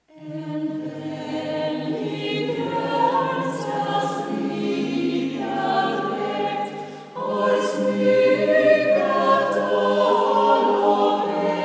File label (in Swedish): Sommarpsalm outtake